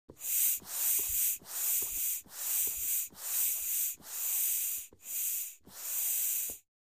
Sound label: Biking Small Bike